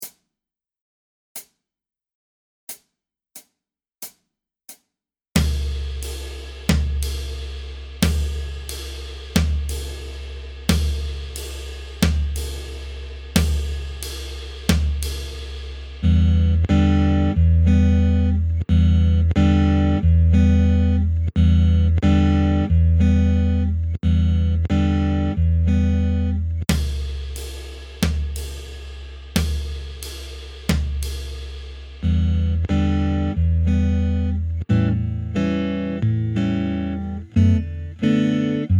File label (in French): bossa, guitare jazz